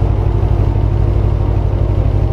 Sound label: idle, vehicles cars m3 e92; tdmcars